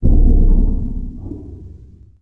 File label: spaceship surrender; Sid Meier's Civilization 4 Complete; Beyond the Sword; Final Frontier; Units